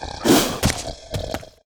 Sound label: attack; Elder